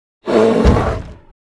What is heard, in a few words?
Mob die; Monster; 설웅